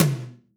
Mid Tom

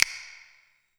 Finger Snaps

